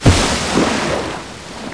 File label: bang; Virtual Sailor